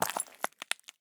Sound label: walk glass; Escape from Tarkov